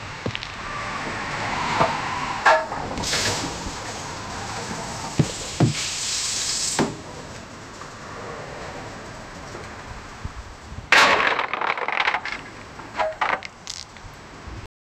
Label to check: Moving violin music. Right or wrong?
Wrong